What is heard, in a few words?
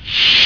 trek door